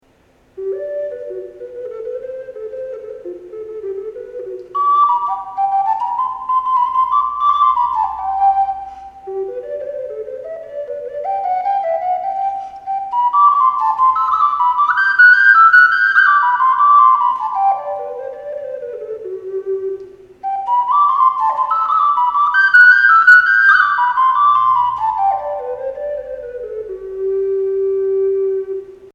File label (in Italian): doppia sol, ocarina